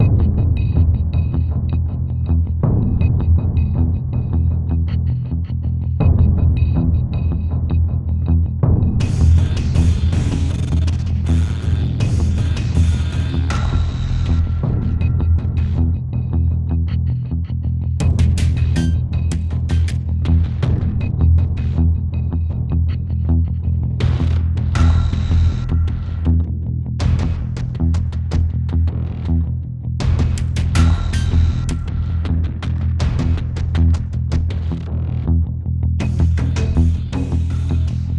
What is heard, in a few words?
Loading Screen; World at Arms Android iOS mobile online Windows gamerip 2012